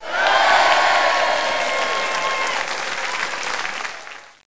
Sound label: applause; PC World Komputer 1998 April A; TALKNOW; MEMGAME